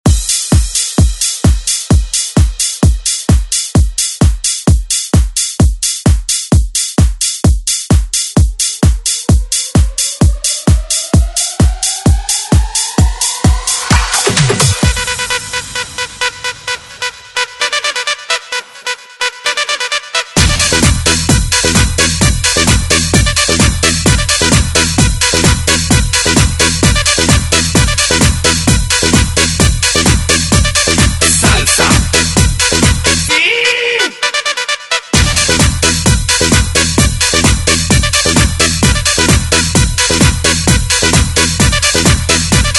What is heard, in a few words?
90s ReDrum; DJ Mhark